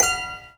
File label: Prepared Piano; Battery 3 Sample Kits